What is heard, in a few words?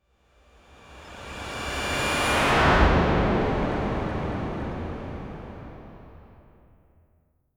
whoosh transition sound, scene change